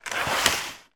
packet selection box chocolates plastic tray pull from box; foley; zapsplat